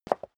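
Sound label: step high heels concrete single stair down, footsteps; schritte; menschen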